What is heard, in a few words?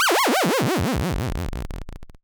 Lose